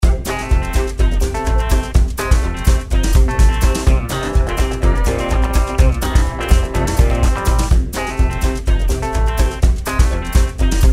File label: ROCKABILLY TRAIN